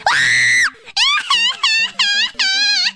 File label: koro scream; korone